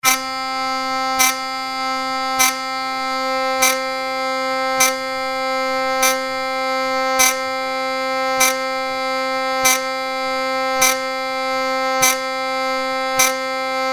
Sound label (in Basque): Zarrabete txakurra SOL 100 BPM